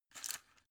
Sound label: drug blister pull out; berufe; arzt